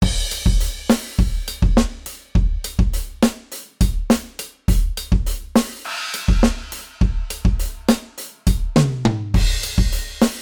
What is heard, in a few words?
modal surf, drums